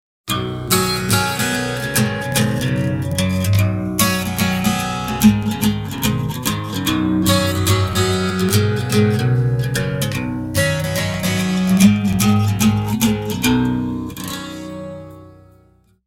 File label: endless acoustic phase